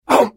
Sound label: Hit; The Elder Scrolls III - Morrowind